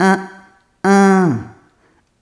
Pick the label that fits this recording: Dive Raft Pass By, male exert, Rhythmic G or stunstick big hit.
Rhythmic G